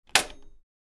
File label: Electric fan plastic button switch off; appliances